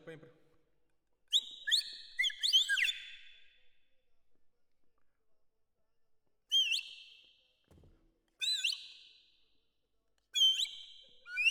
Perc Close

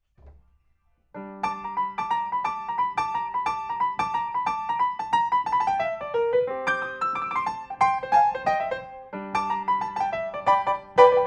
PIANO, RNR for piano

